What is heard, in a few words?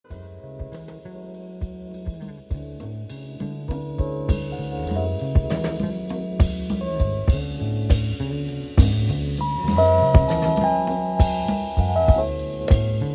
Jazz, Biolateral